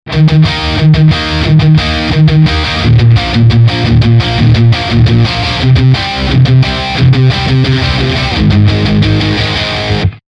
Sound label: High Gain Punk Rock Style Melody Maker